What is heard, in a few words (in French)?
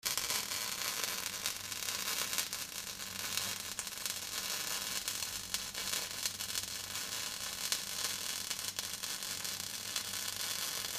Torche Acetylene; ecrins; Mars